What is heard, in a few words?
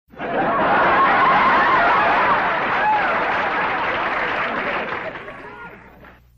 80s laughter